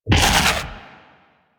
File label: Fairy Dust Impact; DE; Warframe